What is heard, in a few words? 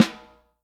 Snare Drum; Q Up Arts; Johnny.C's Vintage Snare Drum